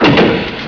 LIFT; DOOM WADS

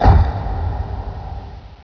echo, cave

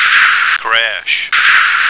crash

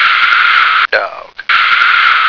dog

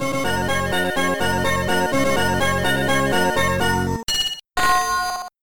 Fanfare; Mystic Towers; Apogee

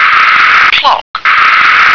clock

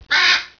SQUAWK

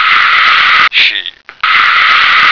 sheep

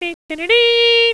fanfare

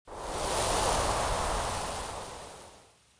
WND GUST

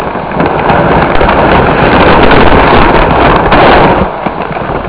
ROCKS

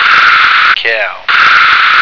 cow

